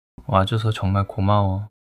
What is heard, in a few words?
crying